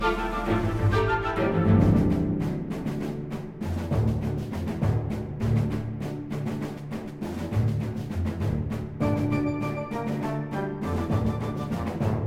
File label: battle military; Shadowhand; macos windows gamerip 2017